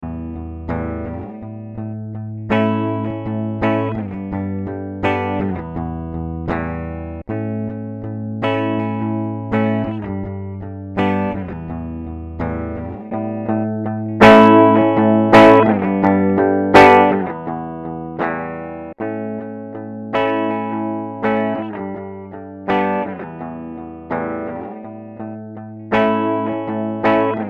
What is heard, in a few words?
Boss EQ 200 Mid High Clean